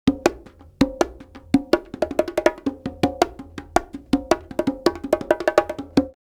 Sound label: Live Perc Riffs; Jungle Frenzy 1